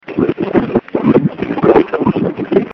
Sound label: Underwater